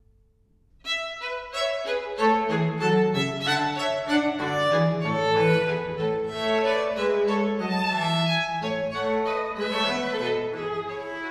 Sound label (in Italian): gavotta allegro; Corelli; Sonata a 3 op. 4 n. 3 da camera